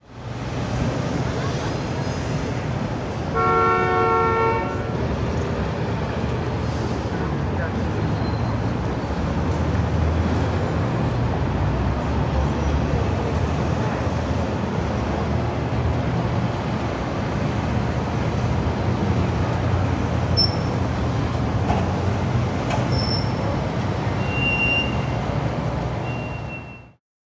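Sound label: Hong Kong City ambience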